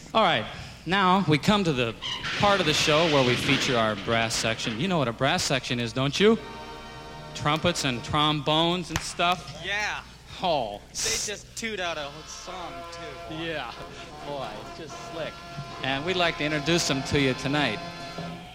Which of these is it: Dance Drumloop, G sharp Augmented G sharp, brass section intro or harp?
brass section intro